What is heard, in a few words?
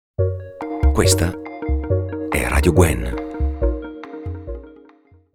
Jingle